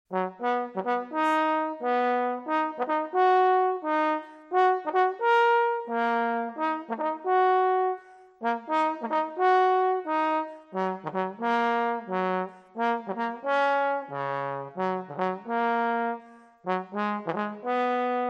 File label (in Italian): Trombone